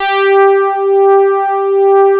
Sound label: MOOG G3